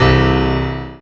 pno g3, Fluegel Bass, AKAI S-612 + S-900

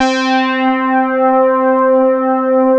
FLANGE ST, SYNTHEX; East West - Global Sounds

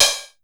hat, drum; Masterbits - Total Zone

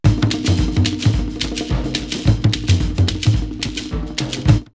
Dakar 108, drumloops World & Ethnic; X-Static Goldmine

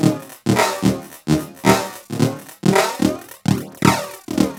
LOOP, TECHNO; TECHNO-BOX; ELEC-LOOPS

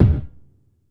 Gated Kick, Gated Reverb; Univerb; musicradar

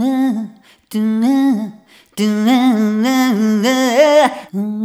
Rhythmic A

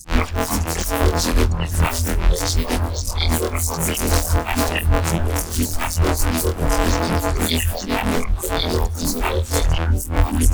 Bass Granulize E

